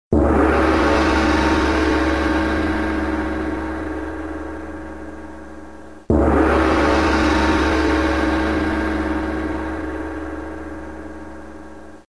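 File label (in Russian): zvuk gonga, gong sound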